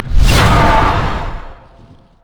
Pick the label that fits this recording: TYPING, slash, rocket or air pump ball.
slash